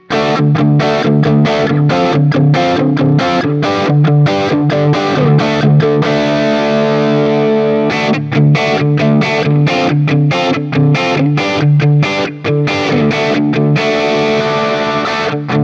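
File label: Guild T Bird ST 90 JCM800 D, guitar; P90; Guild T-Bird ST P90 in Pelham Blue from the Newark St. collection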